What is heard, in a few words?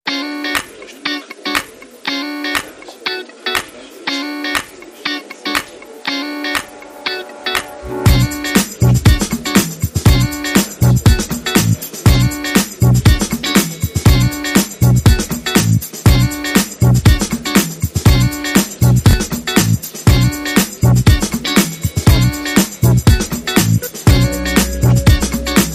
Groovy Strings